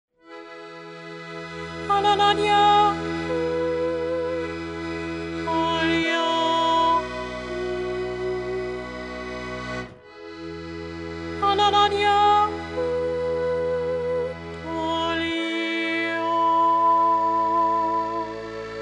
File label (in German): jodler